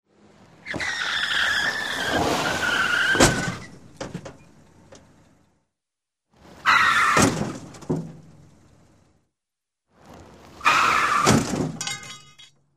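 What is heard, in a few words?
sounds of car crash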